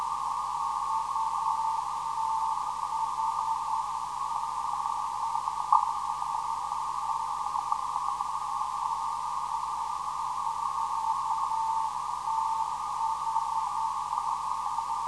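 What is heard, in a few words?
non-directional beacon; UTH; 366kHz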